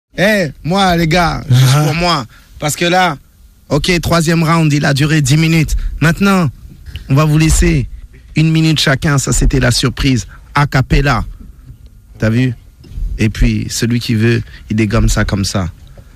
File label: Acapella; Clash Round 4